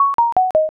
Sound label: score down